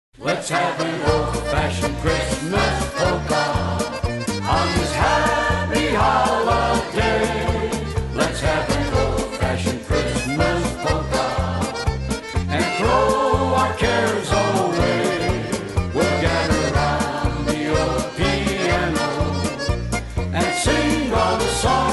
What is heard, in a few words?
Christmas Polka